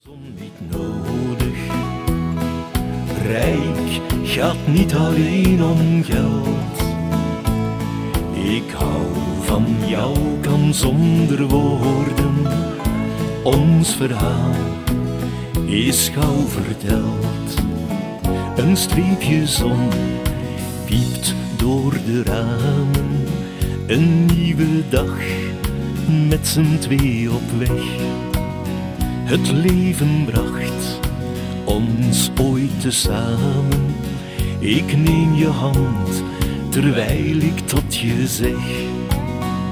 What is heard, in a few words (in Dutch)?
Warm en rijk